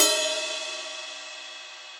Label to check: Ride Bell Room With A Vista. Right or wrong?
Right